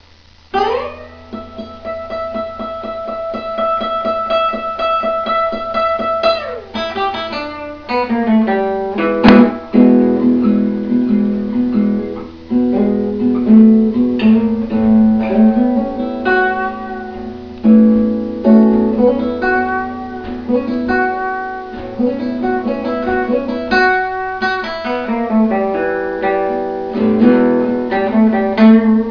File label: blues